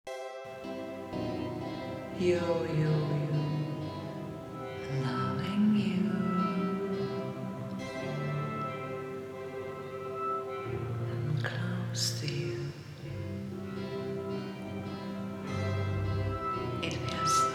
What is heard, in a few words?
romantic